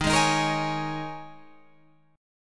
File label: D sharp 7th Suspended 4th, Guitar, Open F; D#7sus4; strummed